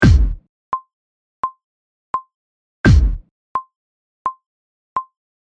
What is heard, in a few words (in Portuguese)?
semibreves, bateria; tacao de bateria